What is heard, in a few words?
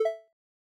toggle on